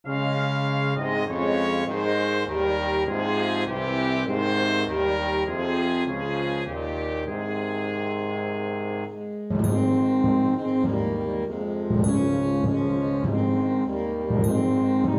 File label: Saxophone Track with Melody; While Shepherds Watched